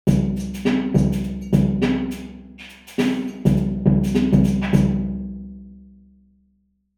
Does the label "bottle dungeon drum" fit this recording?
Yes